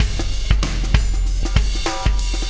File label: Wormhole Beat, Processed Drumkits